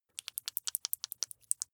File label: flesh wet fast squish